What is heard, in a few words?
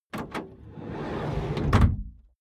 car slide door close